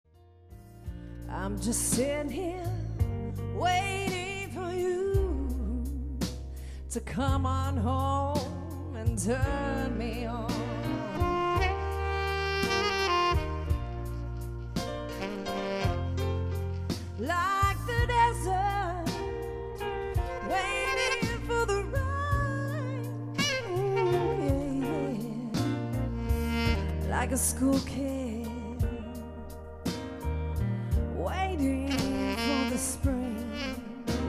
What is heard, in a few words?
Pop duo, sax guitar duos